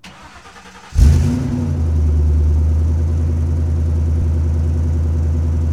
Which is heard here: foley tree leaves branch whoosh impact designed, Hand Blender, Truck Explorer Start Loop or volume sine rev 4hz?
Truck Explorer Start Loop